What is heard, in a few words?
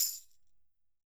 Roland MV8800 percussion